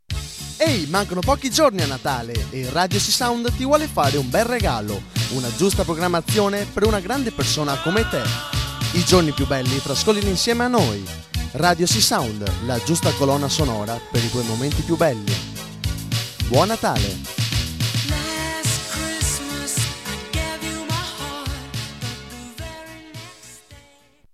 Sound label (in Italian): JINGLE NATALE